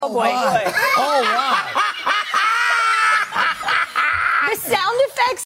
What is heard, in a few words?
laughing hysterically; Homelander; theboys